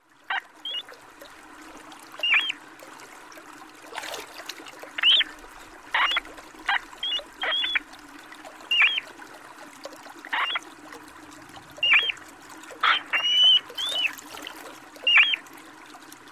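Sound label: muskrat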